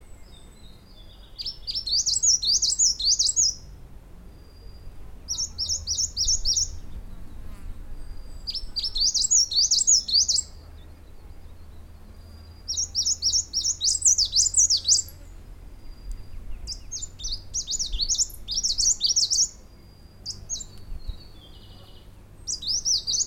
warbler hartert leaf Phylloscopus goodsoni fokiensis